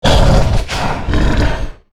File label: Charge Attack Vox; Evolve; Meteor Goliath